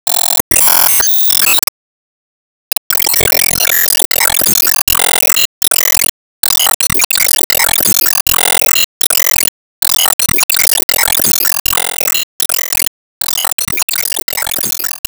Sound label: Gacha Intro Normal Loop